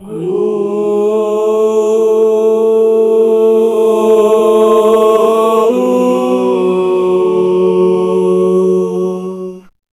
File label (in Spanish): culto tribal de varias pe; world